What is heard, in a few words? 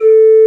flute